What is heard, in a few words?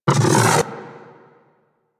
Arch Gun Alt Charge Up; Weapons; Warframe; Archwing; Hildryn